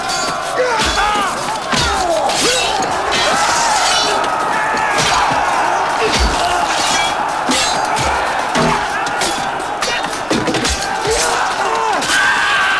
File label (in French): abordage; Pirates Of The Caribbean